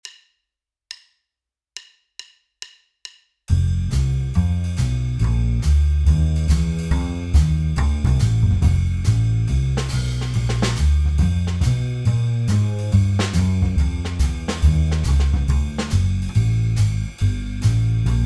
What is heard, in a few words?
blues in f